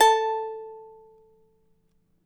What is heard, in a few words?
CHARANGO